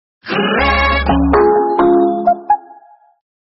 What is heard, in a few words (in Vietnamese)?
nhac chuong original